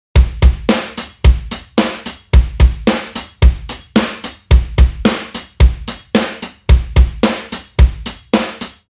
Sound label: 110 DRUM LOOP